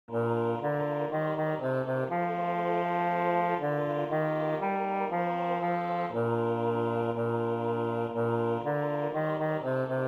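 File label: Ding Dong Merrily On High bass, Choir; Koren julsanger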